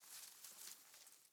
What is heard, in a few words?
leaf rustle grassy soft